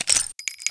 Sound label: mp412 bullet out; garrysmod